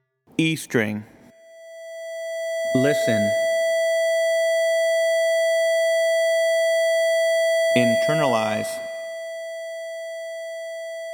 E String Tuning Sequence; Automate and Motivate 21st Century String Players with Technology

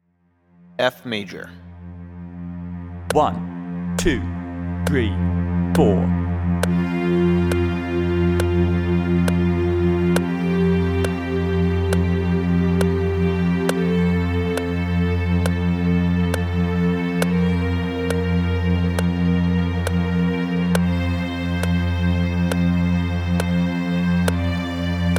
F Major Scale with Drone; Automate and Motivate 21st Century String Players with Technology

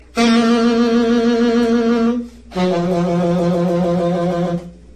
eflat gsharp trills

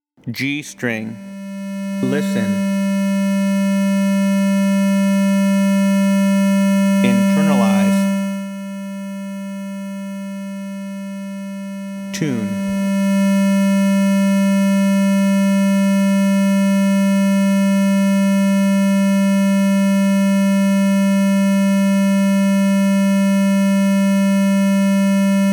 G String Tuning Sequence; Automate and Motivate 21st Century String Players with Technology

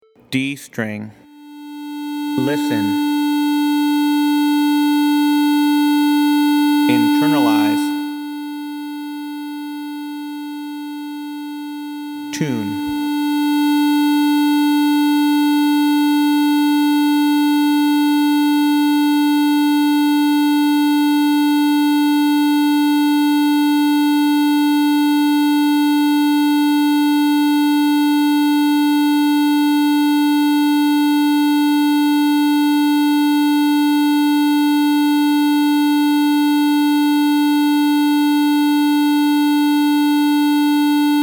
D String Tuning Sequence; Automate and Motivate 21st Century String Players with Technology

